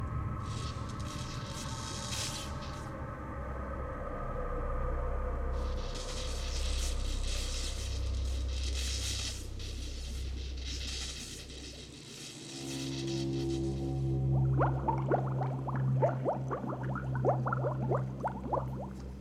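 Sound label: mad scientist lab, video game soundtrack; escape from frankensteins tower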